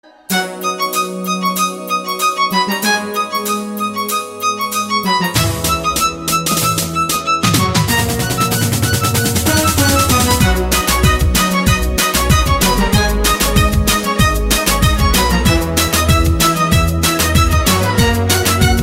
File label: sweet instrumental